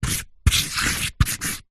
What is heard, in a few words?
marker pen highlighter luminous draw diagram on cardboard